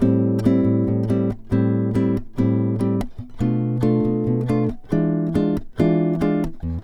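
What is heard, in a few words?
electric guitar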